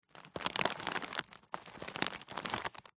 food potato chips packet 100g movements handling gentle; zapsplat